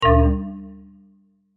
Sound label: error sound